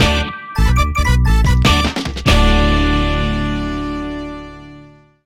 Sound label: Stinger (Fanfare); super mario strikers gamecube